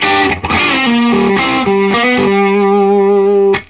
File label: SOLO G, Vintage Blues Guitar